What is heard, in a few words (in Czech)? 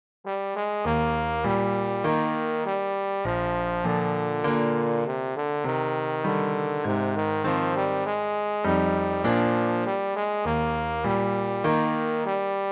trombon; Přednesová skladba pro trombón; Defilé - trombón; j j fux